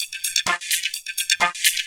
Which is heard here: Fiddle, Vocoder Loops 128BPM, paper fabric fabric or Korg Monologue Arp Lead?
Vocoder Loops 128BPM